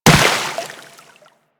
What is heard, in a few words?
Infested Runner Step Water; Warframe